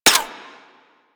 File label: Rifle Mech A; Drake